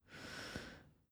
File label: breath inhale